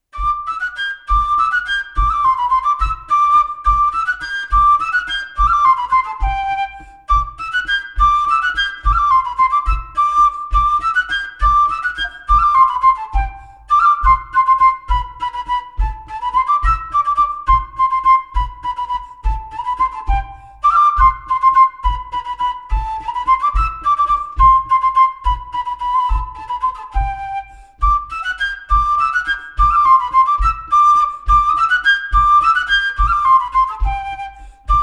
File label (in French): bourree d Auvergne